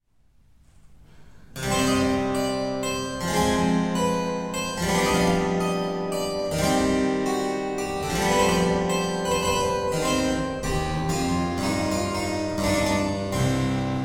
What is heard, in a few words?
Keyboard Sonata in D Minor (Aria)